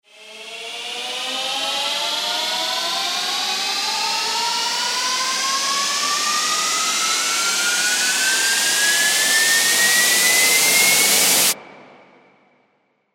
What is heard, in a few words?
Aftermath Riser Climax Teaser